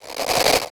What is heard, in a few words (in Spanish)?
rascador